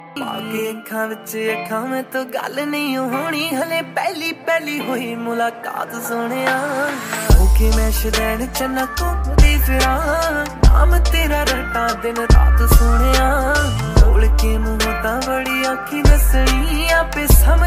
korean mix hindi song ringtone, bollywood ringtones